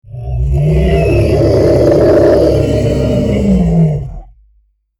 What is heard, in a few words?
Monster Growl; horror; horror sfx vol 1